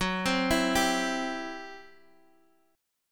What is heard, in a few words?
C Major F sharp